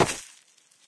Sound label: dirt, player footsteps